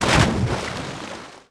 splash large; water splashes

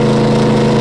KING LOW, Engine; Cars; XBOX

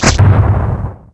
swat

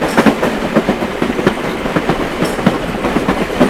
train; Truckee

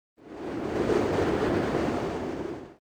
high wind gust; ambience; Soldier Of Fortune II Double Helix; XBOX; kamchatka

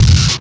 menu; Tony Hawk's Project 8; XBOX

